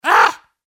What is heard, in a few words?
bigbrother yell; Angry Birds